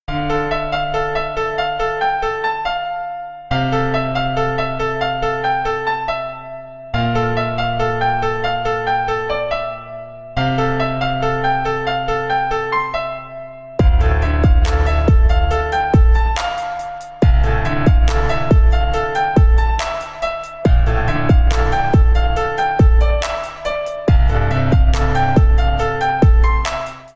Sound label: piano beat